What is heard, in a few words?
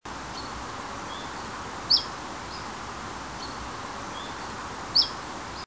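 Phylloscopus collybita, Chiffchaff strangely calling; Helsinki, Uusimaa, Finland 26 September 1999; Linnut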